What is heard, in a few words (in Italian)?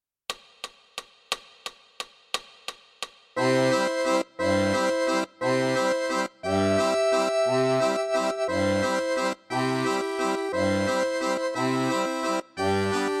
valzer; Czerny